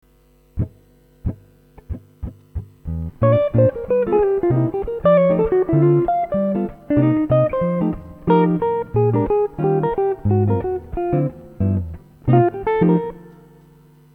Rhythm Changes Licks Last Four Bars

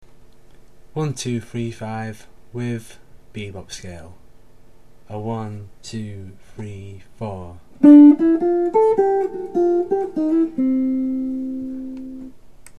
1235 with bebop scale

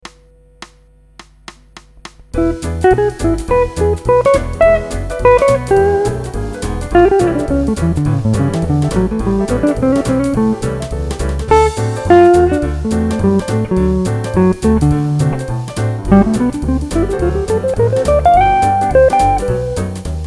fast jazz guitar solo

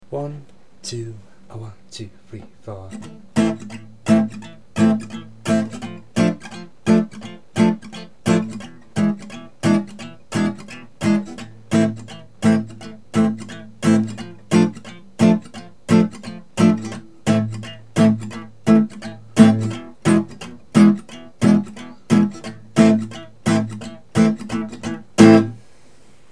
chord study, guitar

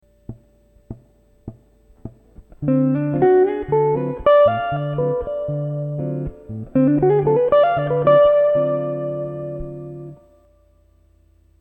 Modal Licks Lydian Dom, guitar

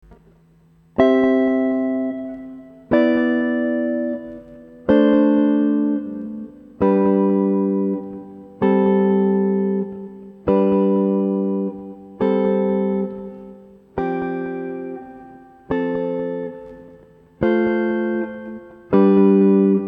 Chords

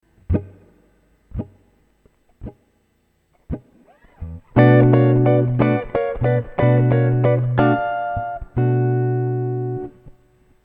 Minor Blues Licks Example

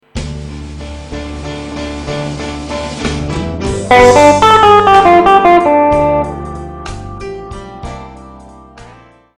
Guitar Lick; Guitar Lesson

